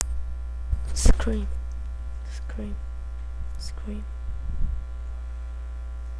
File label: scream